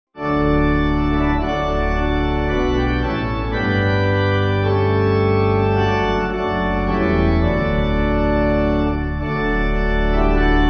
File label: Pipe; Monks Gate